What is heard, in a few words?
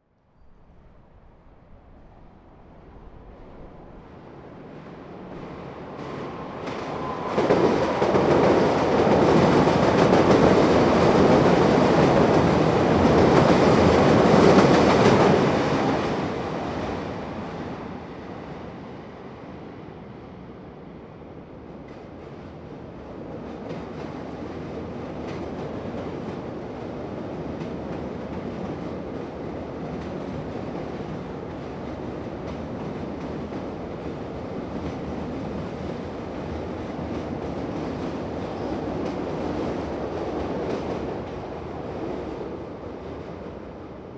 Two Elevated Trains By, Chicago, Exterior; First One Fast And Second One Slow , Medium Close Exterior Perspe; Vehicles